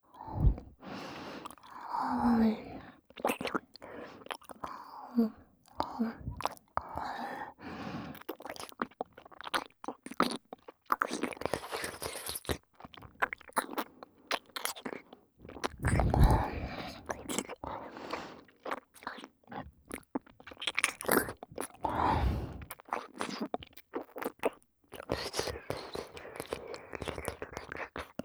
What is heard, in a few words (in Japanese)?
エロ吐息多めの密着耳舐め【左耳】ループトラック, ASMR; 高身長バレー部むちむち爆乳彼女との、真夏の蒸し蒸し汗だく溺愛搾精えっち